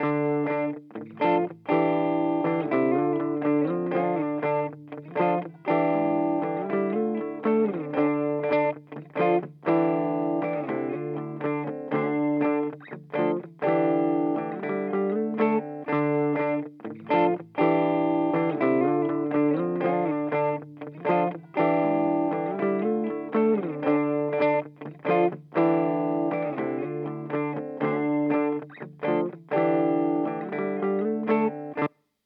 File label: Shure SM57 virtual; Torpedo CAB M